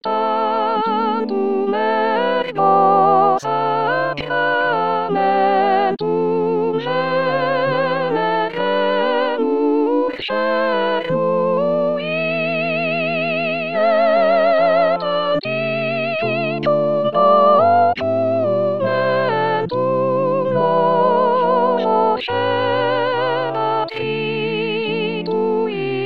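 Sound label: soprano; deodat de severac; Severac Tantum ergo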